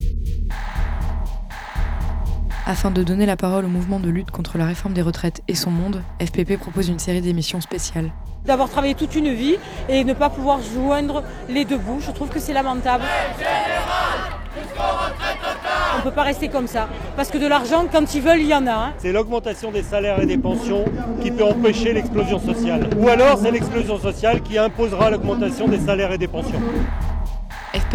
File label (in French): jingle; Jingle Contre la retraite à 64 ans et son monde !